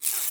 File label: adhesive tape rip; strap